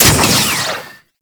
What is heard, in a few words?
Heat Gun Fire; Warframe; Grineer